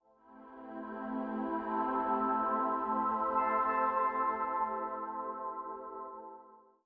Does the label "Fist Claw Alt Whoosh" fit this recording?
No